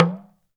TALK DRM, Heart of Africa; Spectrasonics

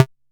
percussion; FutureRetro FR777

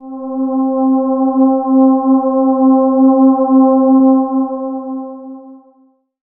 pad, Dance Synths; Best Service ProSamples vol.37 - Dance Synths

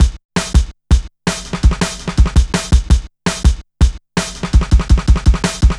Shuffling Live, Jungle Drum Loops

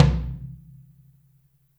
FLTM OH R, Kit OH Mic, Drums Percussion

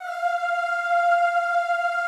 F4-R, Classical Choir; Best Service; Extended Classical Choir

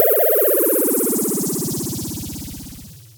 FX, Dual Percussion Synthesiser; Klone

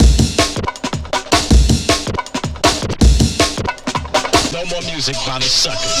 160, drum loops, drum & bass; Total Drum&Bass

